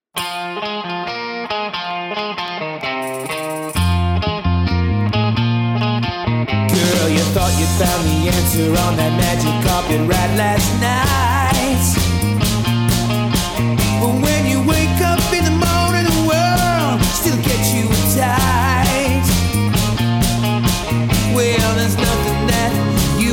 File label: classic rock band; Live Band